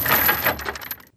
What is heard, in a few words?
chain; wagic; mtg